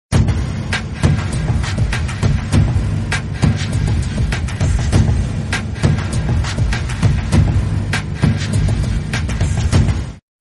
ringtone; Nokia Lumia 520

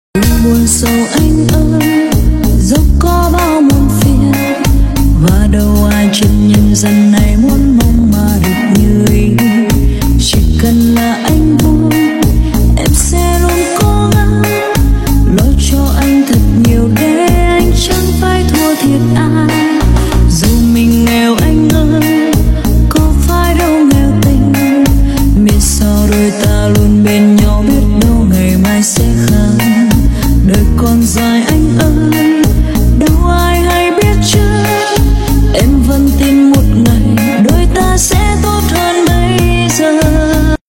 cover rumba

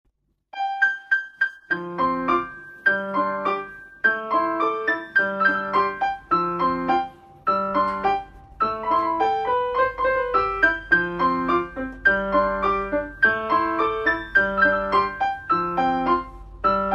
piano

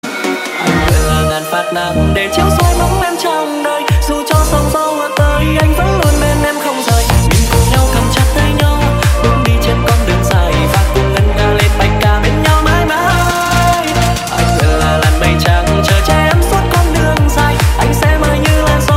remix

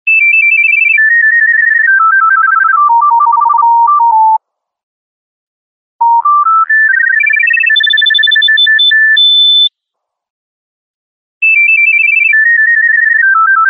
nokia pinpin

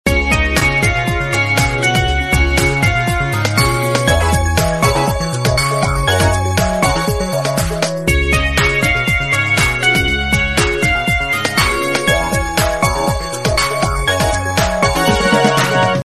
Underwater World, Samsung Galaxy S10

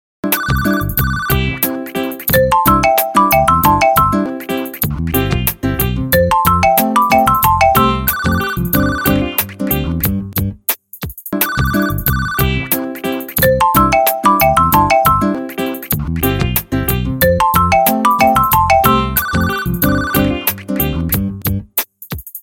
vivo call

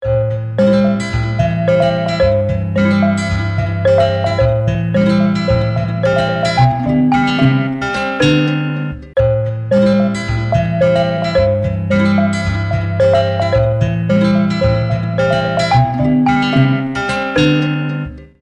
oppo a2, nhạc chuông

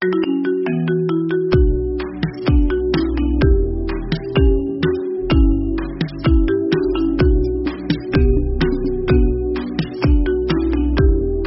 remix tiktok ringtone marimba iphone remix

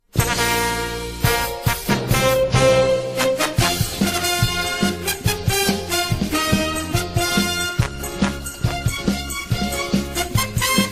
ringtone; dragon ball

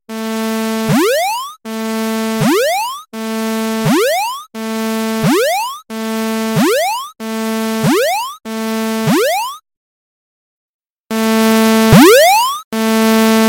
classic alarm

